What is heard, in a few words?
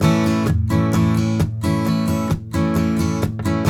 RIFF, Blues Guitar; ILIO; Fretworks